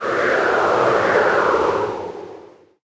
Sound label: Wind; The Denpa Men; Android